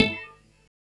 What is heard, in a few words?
Industrial 140